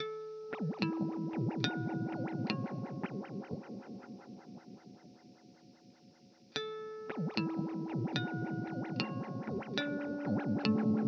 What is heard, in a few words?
Teisco Delay Modulation